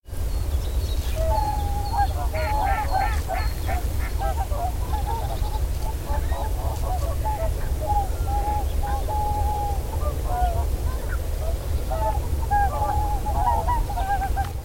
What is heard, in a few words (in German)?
singschwan